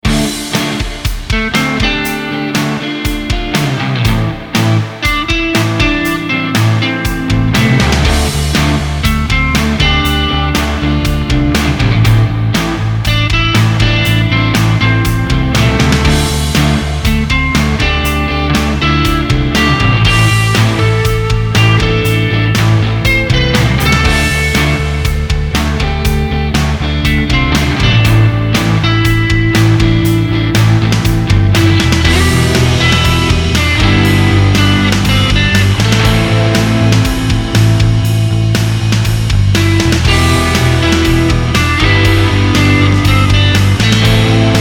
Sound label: Silky Smooth